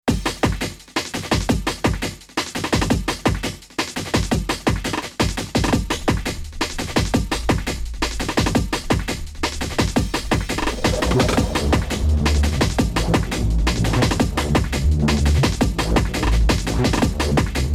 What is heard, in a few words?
Roland TR 8S Pattern